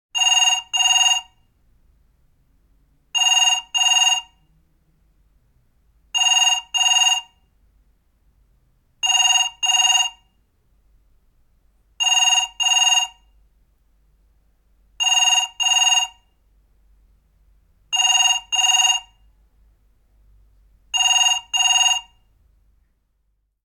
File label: Telephones; Industry & Commerce